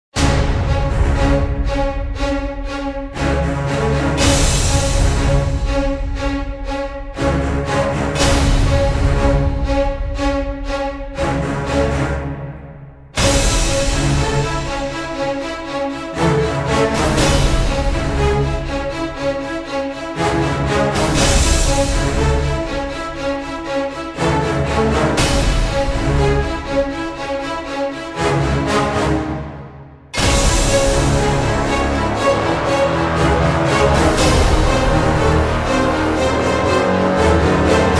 No Vocals, Epic Action & Adventure

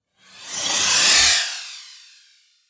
Storms Meteor; Gothic Storm Music; Advanced Sound Design

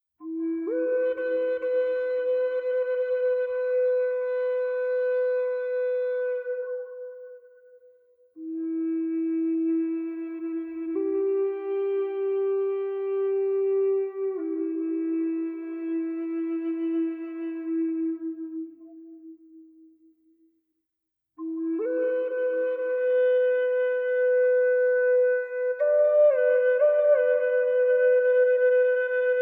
Devotional Melody, American Flute; Native American Flute for Massage Meditation & Healing

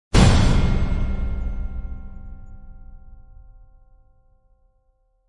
Game Bell Smash; Gothic Storm Music

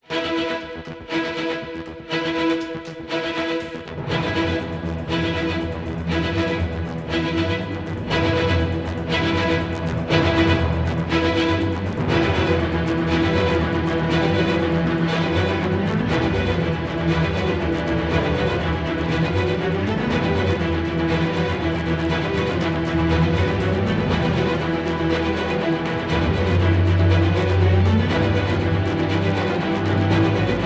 Emergency Ambient

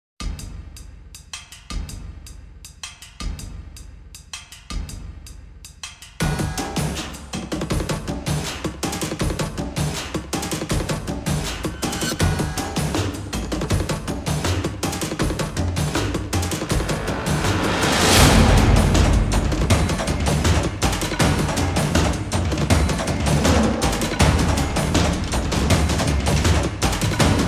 Percussion; Fired Earth Music

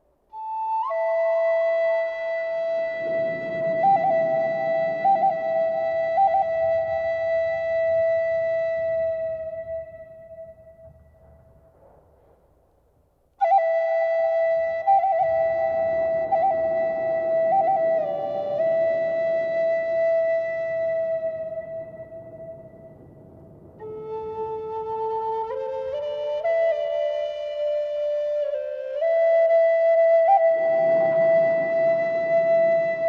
Peaceful Rain Storm & Flute

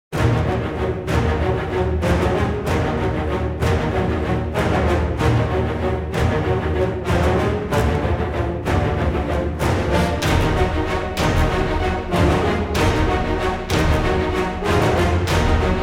Without Choir; Fired Earth Music

